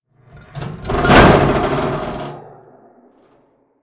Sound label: metal snap break